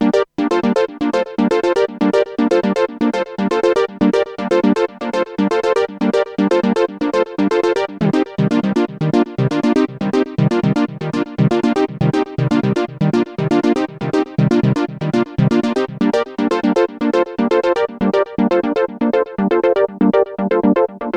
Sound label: pseudo saw